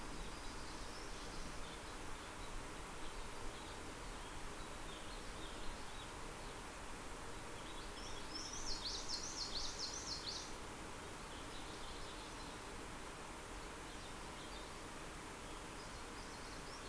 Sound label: mainly bird cluster